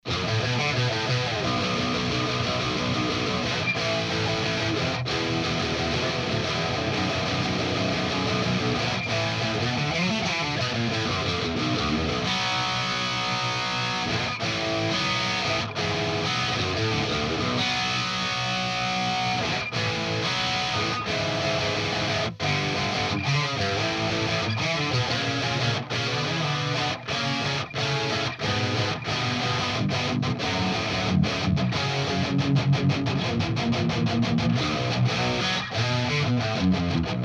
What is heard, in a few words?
REAL SAVAGE